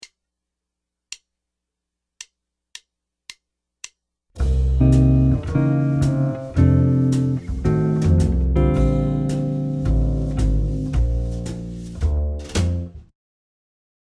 ii V I chord solo lick, guitar

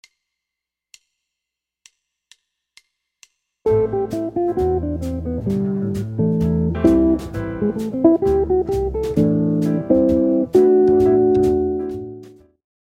quartal chords, guitar

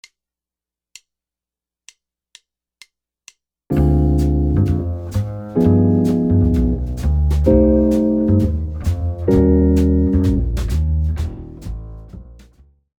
jazz guitar comping

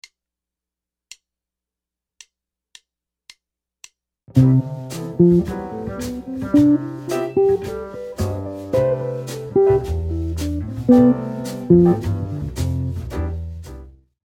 Scale Rhythms, guitar

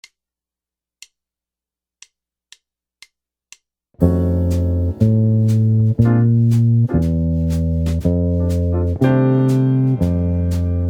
walking bass guitar

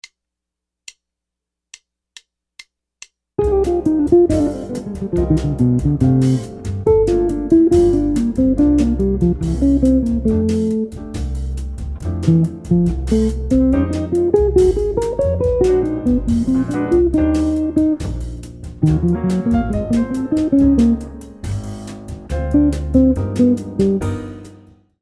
brazilian jazz licks, guitar

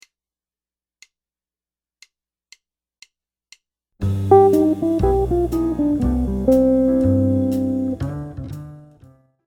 lick, jazz guitar

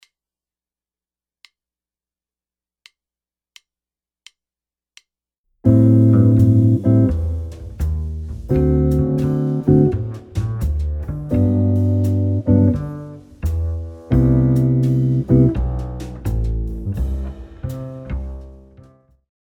diatonic chords, jazz guitar

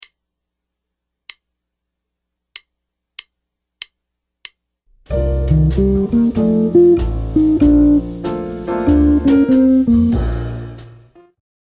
ii V I licks, jazz guitar

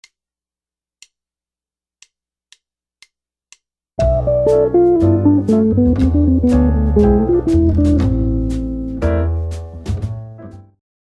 lick, guitar

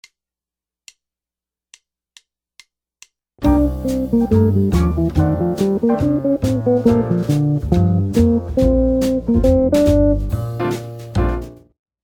lick, jazz guitar

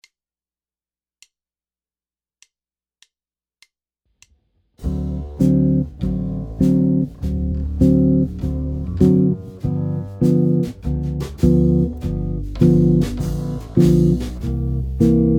jazz chord progression, guitar

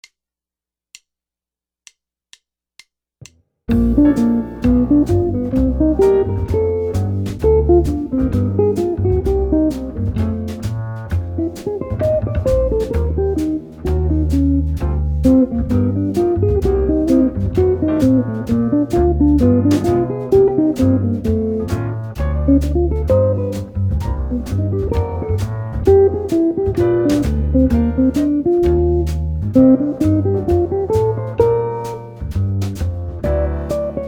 rhythm changes, jazz guitar

